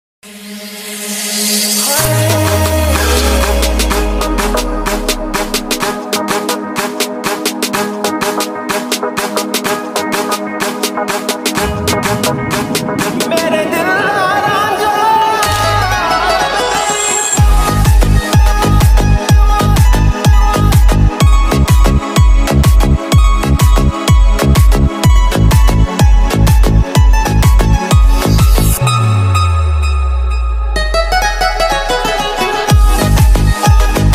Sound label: Melody